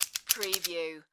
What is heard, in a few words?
Counter Handheld; sound effects bible